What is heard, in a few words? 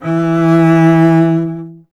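STR CBS, Solo Instruments, double bass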